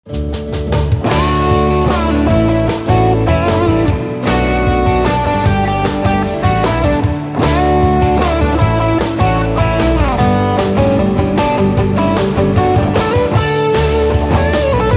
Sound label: guitar solo